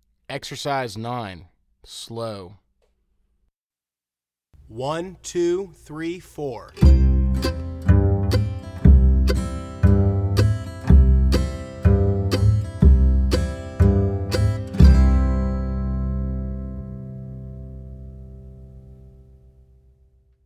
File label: Slow; Exercise 9